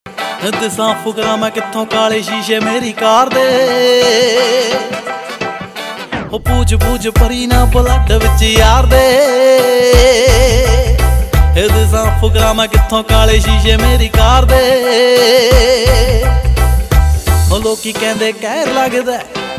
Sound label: Punjabi Beats